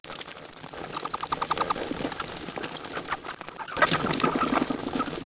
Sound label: bats; Microsoft Graphics Studio Greetings; Microsoft 1998